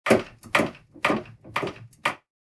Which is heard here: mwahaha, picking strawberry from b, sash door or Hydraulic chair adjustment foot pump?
Hydraulic chair adjustment foot pump